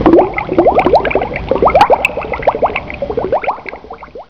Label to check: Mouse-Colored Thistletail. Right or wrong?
Wrong